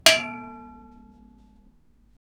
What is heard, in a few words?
the sound of a metal, everyday objects